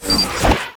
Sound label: Attack; PACHI; Mob